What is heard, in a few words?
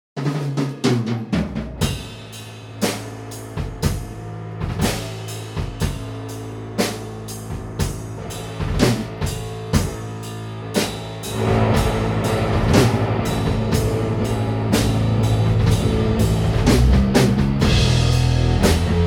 Drum